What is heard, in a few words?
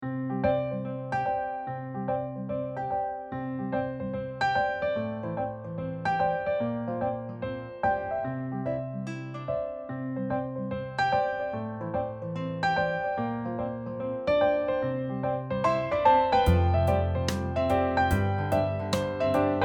Little Spaces Accomp